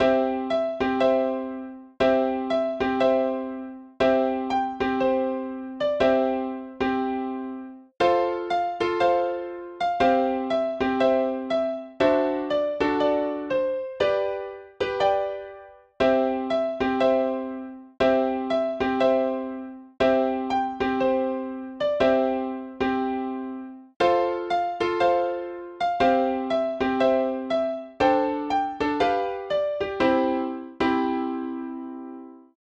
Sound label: jingle bells